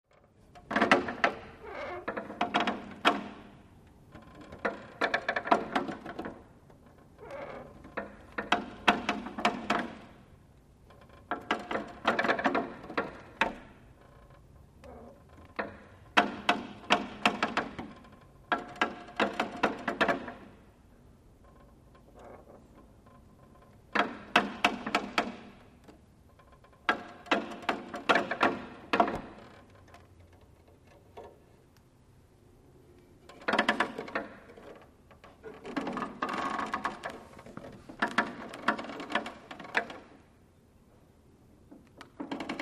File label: Wood Seat Creaking; seats & stools